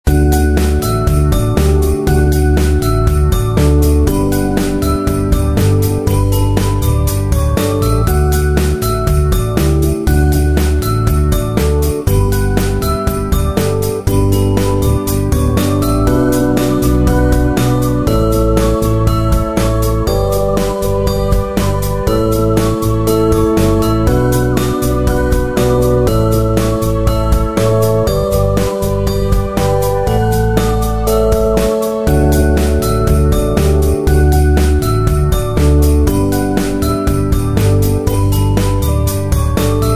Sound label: quirky holiday bells